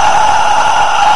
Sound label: snow breath; blizzard spawn